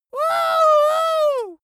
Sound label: dwarf cheer "wuhu"